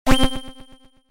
Pickup: Generic Pickup SFX For Collection Of Item, Video Game; Games; Stuart Duffield